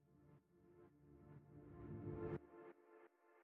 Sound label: music interactive; World of Tanks